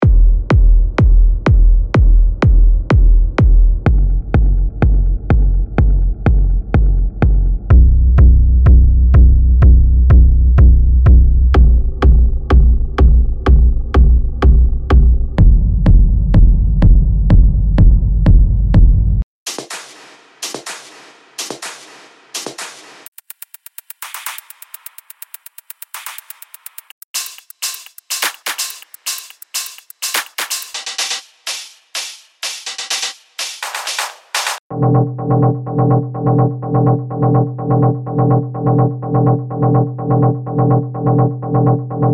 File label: techno